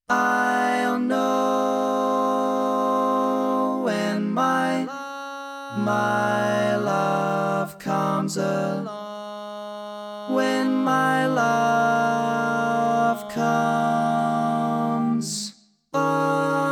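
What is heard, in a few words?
Baritone, barbershop tags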